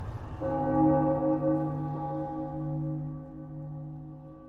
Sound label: bell notification sound; bloodborne